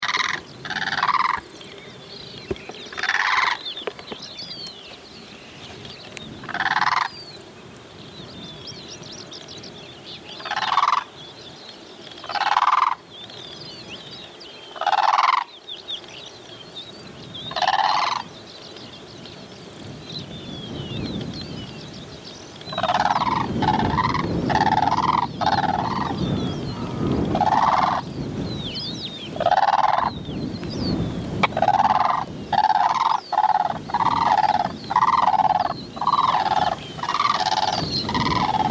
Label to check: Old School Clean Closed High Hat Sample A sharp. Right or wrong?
Wrong